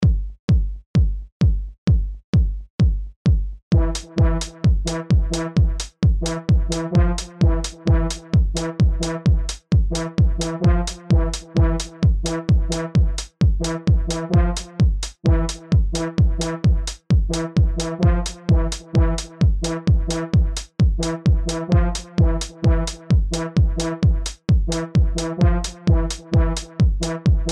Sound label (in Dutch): Hoorn Groove partij